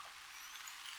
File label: Chirp